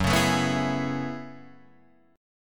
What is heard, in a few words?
F sharp Minor 11th, Guitar, Drop D